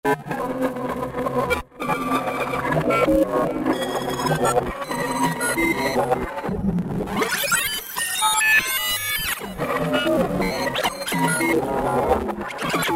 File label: Roland V-Synth Man Machine; Factory Patches